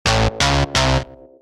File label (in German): Instrumental short